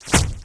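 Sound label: missile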